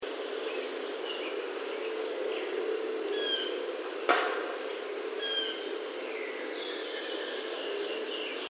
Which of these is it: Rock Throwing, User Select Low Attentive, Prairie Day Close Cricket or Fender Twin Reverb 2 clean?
Rock Throwing